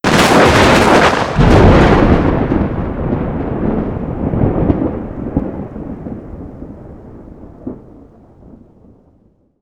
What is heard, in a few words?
thunder strike; amb elements; modern warfare 2